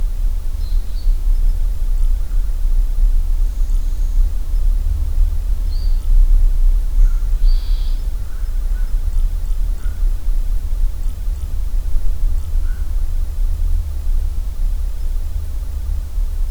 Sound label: room ambience with very low wind